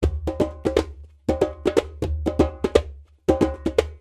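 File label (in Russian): djembe melody rhythmic 120 beats latino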